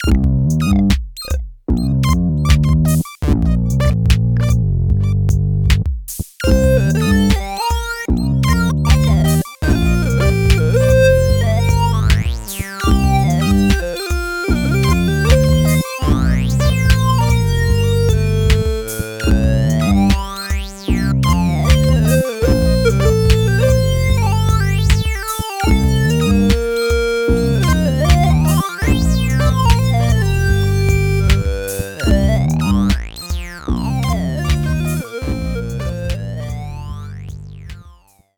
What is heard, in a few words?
crazy melody